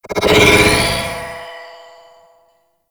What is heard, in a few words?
Reckoning Attach; Warframe; Paladin